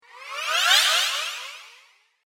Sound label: Cinematic Whoosh Flyby